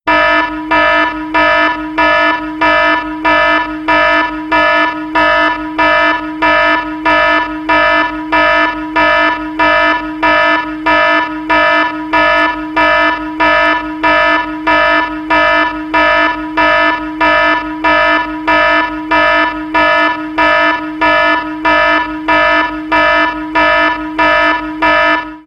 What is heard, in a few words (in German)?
Alarm Alarmanlage